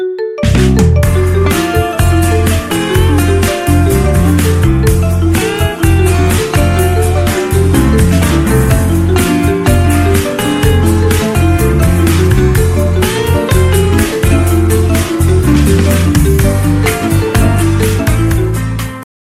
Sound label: Xiaomi Ringtones